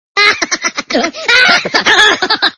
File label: spring